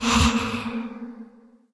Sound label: spector damage